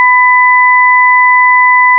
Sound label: 0V5 1K2KHZ